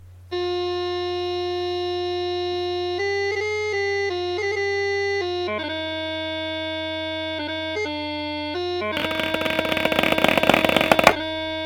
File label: Chanter Snare; North Berwick Pipe Band